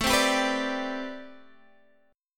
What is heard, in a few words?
A Minor 11th A Guitar Half Step Down